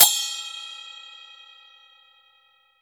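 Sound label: SMALL CYMBAL, CHINESE PERC; Spectrasonics; Heart of Asia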